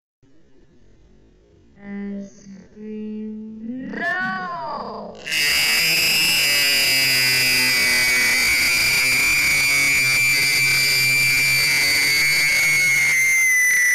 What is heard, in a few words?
me screaming at 3 00 am in the morning